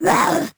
grabbed; Crypt of the NecroDancer

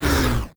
elem ice hit; Crypt of the NecroDancer

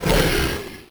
tarmonster cry; Crypt of the NecroDancer

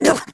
squished; Crypt of the NecroDancer

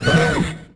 skeleton knight attack; Crypt of the NecroDancer

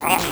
mimic chase; Crypt of the NecroDancer

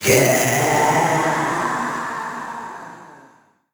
trapdoor; Crypt of the NecroDancer

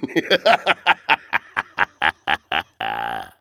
vo laugh; Crypt of the NecroDancer

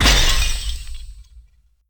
urn break; Crypt of the NecroDancer

